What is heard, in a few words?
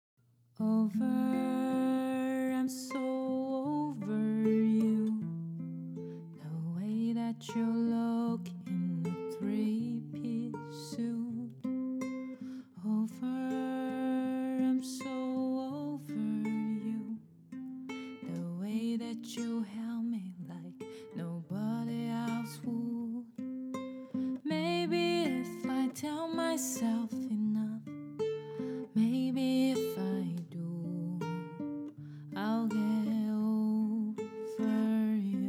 guitar cover